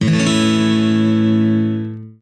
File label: a maj x02220, guitar chord